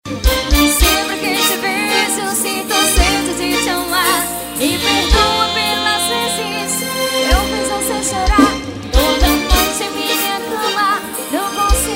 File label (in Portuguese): ao vivo no rest bar; fuxiko rest bar